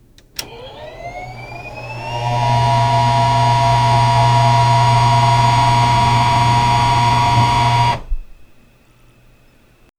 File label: time machine powering up and activating